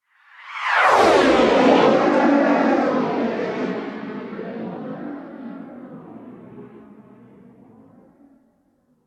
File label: Flanged Space Whoosh